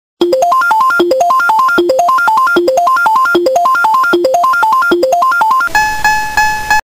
super mario kart item box; Memes

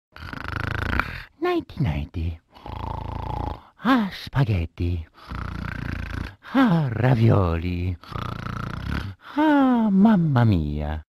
sleeping; mario

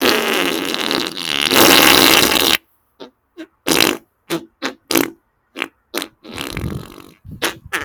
FARTS; memes

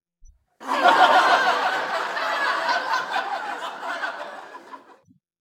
circus laughing crowd

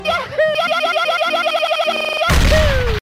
ya ya ha ha ha haaahoo; speedrunner

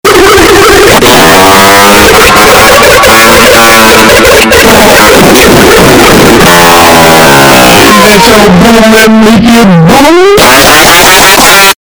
long brain fart earrape

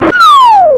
super mario kart throwing; Memes

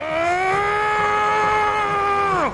screaming

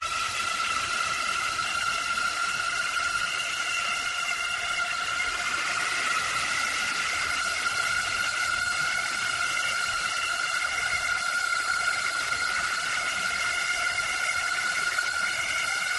demons screaming in hell; soundbible; Memes

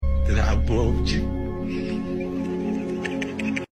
hhhh

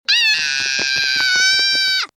SCREAMING; TIMMY TURNER

